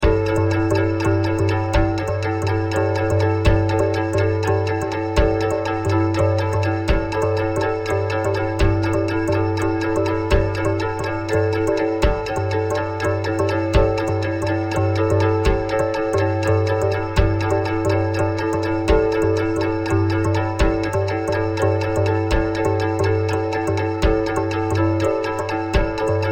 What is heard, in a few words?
2 5 polyrhythm